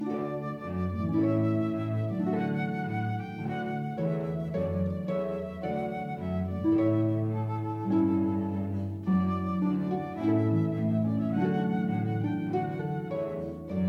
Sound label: Trumpet Voluntary, harp